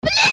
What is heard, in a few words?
Bell